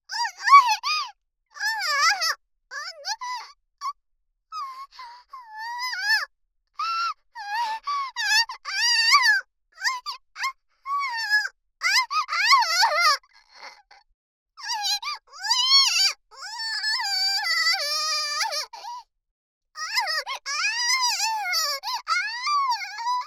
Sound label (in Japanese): レイプ SEX・無理矢理に媚薬を使われ泣きながらの激しい喘ぎ声と悦びの声; 生意気少女系カノジョ